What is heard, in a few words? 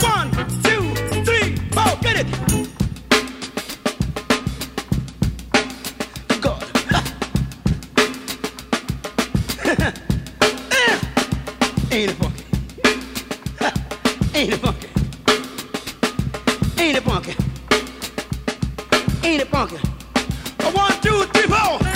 funky drummed, drum loops